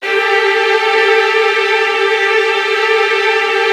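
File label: Virtuoso Strings, violin trills, G2; ILIO; Kirk Hunter Virtuoso Strings VOL-1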